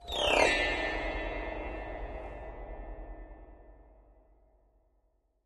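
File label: Grand Piano Strings High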